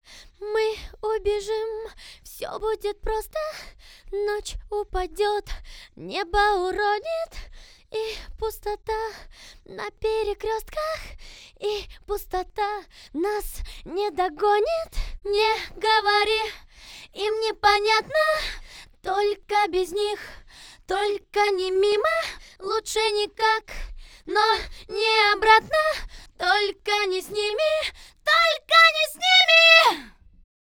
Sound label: VOX Couplet, acapellas